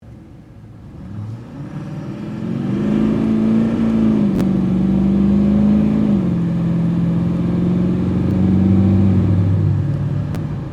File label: lmm chev inside